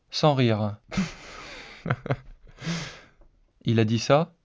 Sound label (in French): rire synthese basique